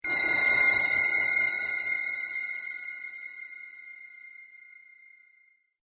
Eerie Chimes Scary Suspense Dark Metallic; Horror; Scary Tones; BBFX

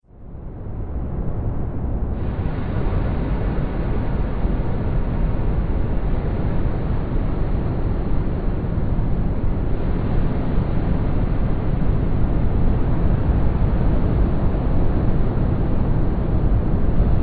Sea Wind Ambience Drone; Drone Sound Effects

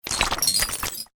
Magical Chains; Magic Sound Effects; Andrew Fleming

